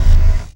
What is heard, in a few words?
FX Hit; Drums & Drones